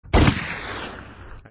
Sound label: gunshot button